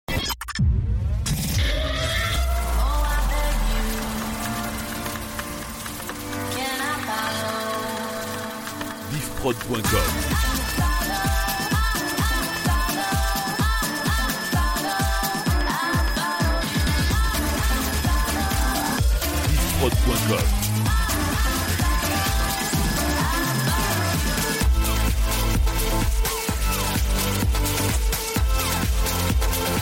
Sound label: Medley Electro 2010 IDM